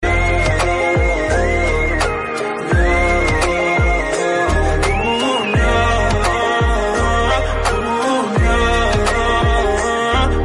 Flute Ringtone, New Flute Ringtone

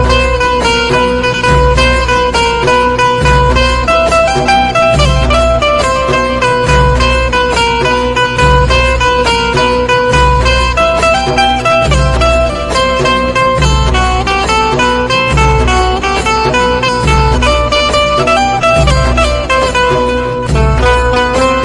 Instrmental Ringtone

